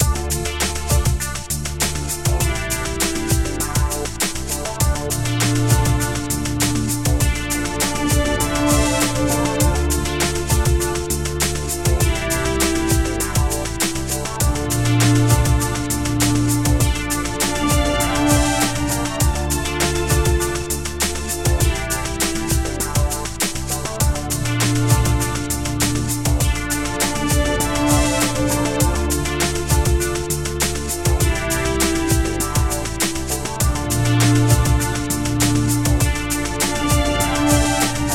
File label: full mix film eq